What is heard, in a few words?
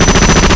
MACHGUN; Shadow Master